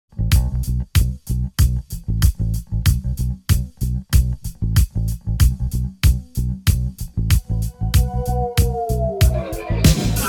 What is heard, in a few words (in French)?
accords